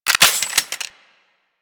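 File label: submachine gun Reload; Warframe; Tenno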